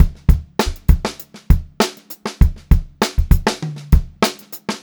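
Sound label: STRAIGHT VARIATION, Funk-Groove Drumloops; USB Soundscan vol.38; AKAI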